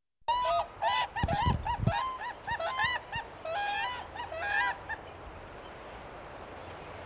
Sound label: whooping cranes